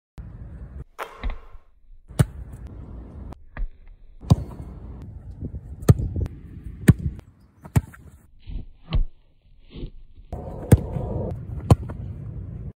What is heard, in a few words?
rugby ball